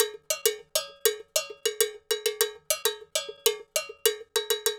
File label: Cow Bell, Latin Percussion